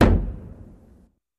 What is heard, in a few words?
Staff Hit Single, spears & staffs